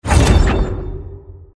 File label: shield; xianzhou cruise